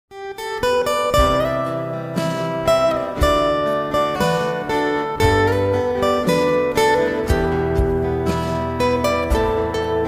nhac chuong guitar

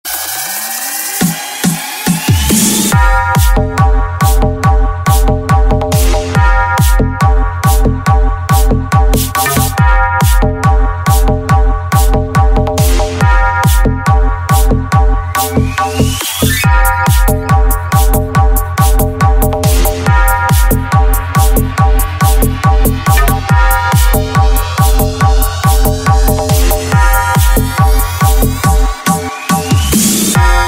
Nhac Chuong Remix

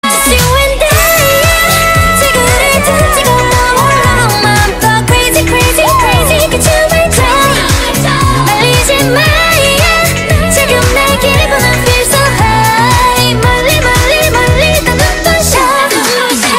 nhac chuong dance

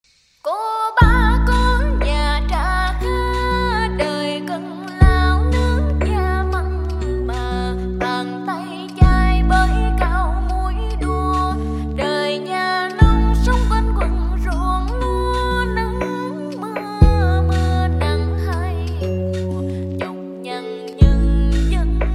nhac chuong lofi giong nu; tiktok

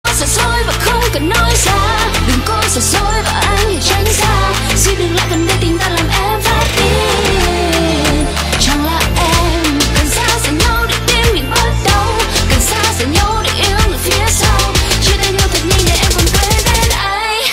nhac chuong remix

